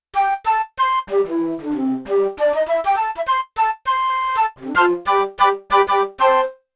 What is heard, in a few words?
Flute + Band; Yamaha TX16W; Big Band Performance